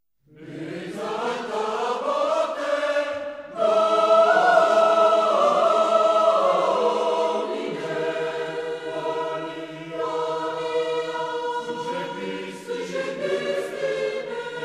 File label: Gregorian polyphony for chorus, Andante maestoso; Momenti of Gregorian polyphony; Exaltabo Te Domine